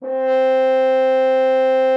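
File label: French horn C3; Xsample VOL-3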